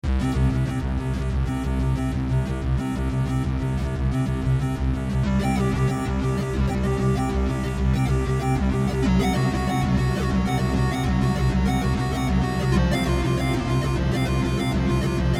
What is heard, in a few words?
squ octave